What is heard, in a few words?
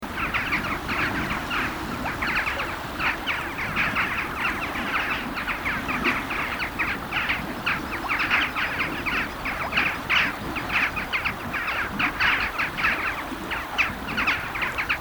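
western jackdaw spermologus, flight call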